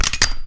smg pickup

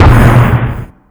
pad; openarena

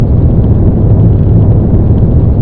boost active

